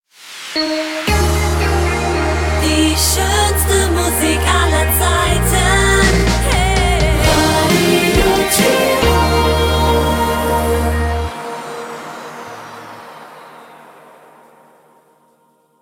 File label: Transition medium to hot